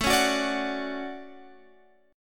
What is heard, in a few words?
D Major 7th, chord